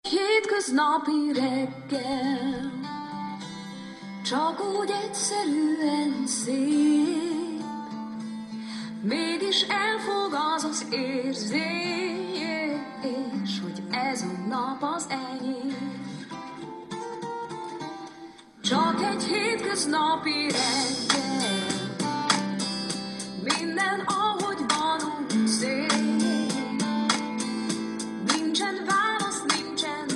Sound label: digitar